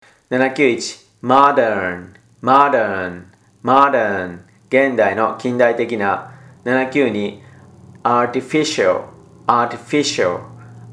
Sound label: tango